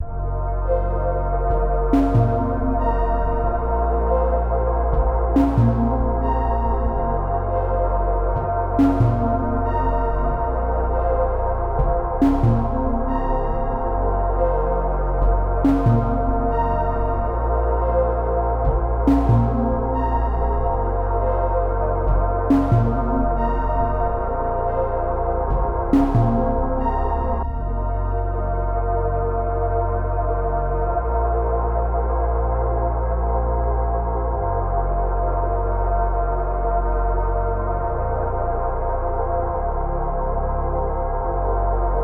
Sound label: 70 BPM A# Min, RnB; Melodies; Desires